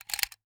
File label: Foley Toy Gun Handgun Cocking